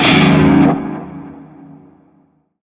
Shawzin Yo Chord E; Warframe; Narmer

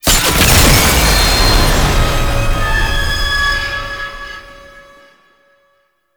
Tank Kill Shot; Quincy; Warframe; NineteenNinetyNine; Stage12PartyOfALifetime

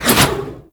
Scythe Spin Whoosh; Reaper

